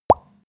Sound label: pop bubbles; soundpool